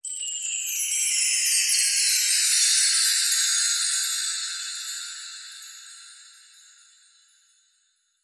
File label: Very Merry Long Chimes Roll